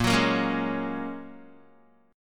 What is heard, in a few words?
Bb Minor 7th Sharp 5th, Guitar; Bbm7#5 strummed